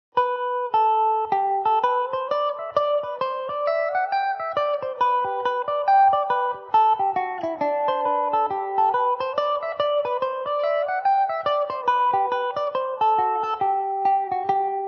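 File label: G major mandobird, irish mandolin; The Spoils Of Victory; Epiphone Mandobird VIII electric mandolin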